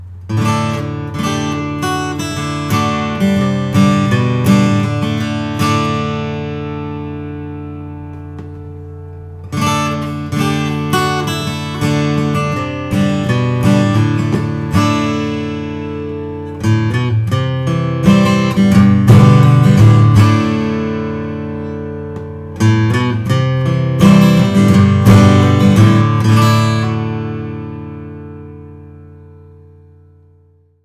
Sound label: martin custom 000 om 14 fret